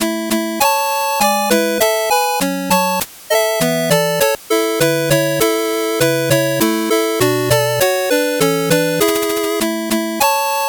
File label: 8bit bgm slow